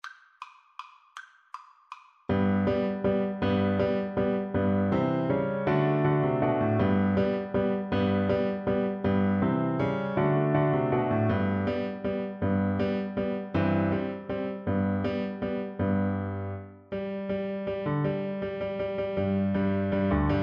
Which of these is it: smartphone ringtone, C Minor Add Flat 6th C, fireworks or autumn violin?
autumn violin